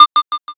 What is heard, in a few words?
tap